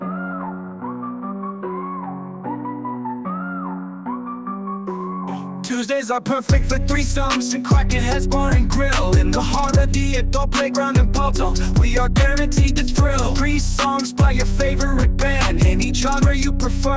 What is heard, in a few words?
Rap